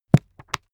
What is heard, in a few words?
book shut